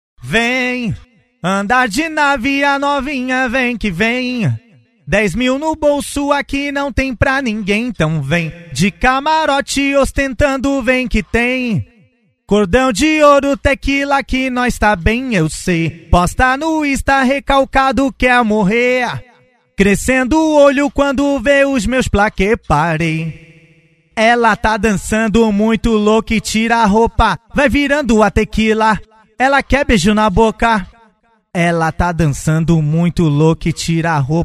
acapellas funk

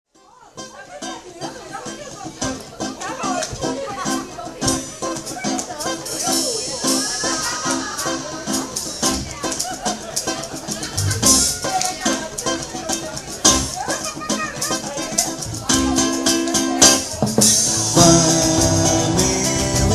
samba

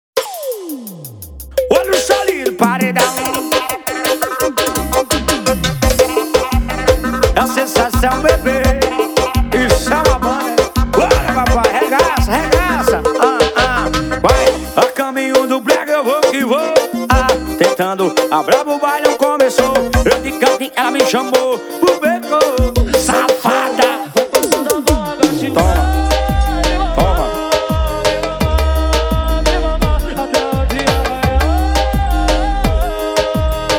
brega; caminho do brega

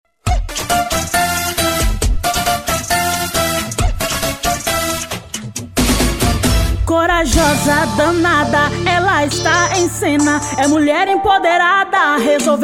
macetando jingle vereador prefeito eleicao campanha politica

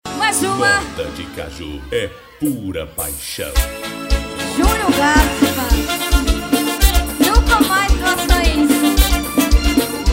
ao vivo no fuxiko rest bar

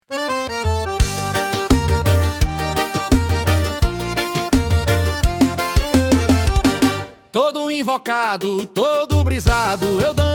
forro

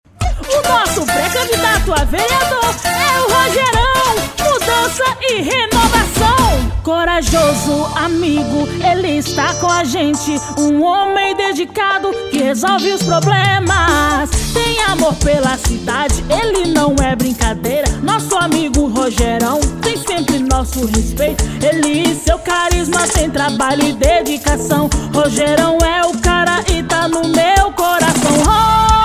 jingle; vereador prefeito eleicao campanha politica

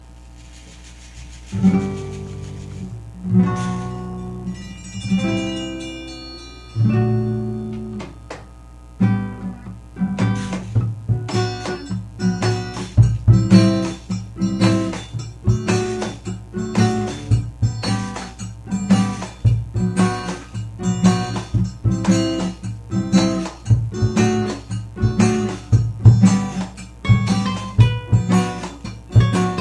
intrumental

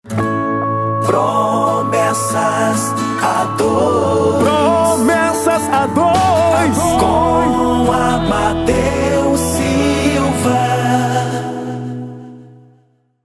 vinheta cantada

